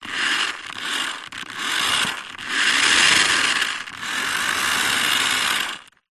science fiction robot move tracks roll floor medium